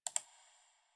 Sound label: Computer Mouse Click Misc; Warframe; Ambience; Nineteen Ninety Nine